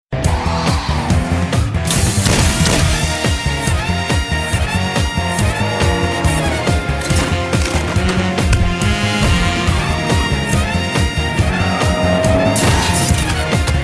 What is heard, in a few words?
Rock; Agent Vinod